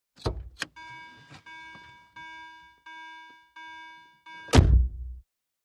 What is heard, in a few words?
Rover Enter Exit, four wheel drives ext., VEHICLES RANGE ROVE; VEHICLES RANGE ROVER: EXT: Range rover, getting in & out, with warning to